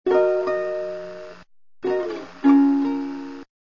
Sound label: guitar